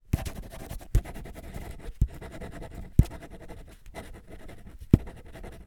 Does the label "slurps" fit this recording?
No